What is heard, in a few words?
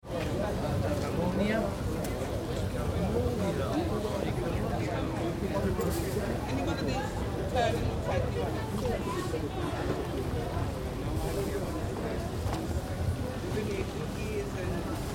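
Town Market Atmosphere - Crowd chatter - voices and activity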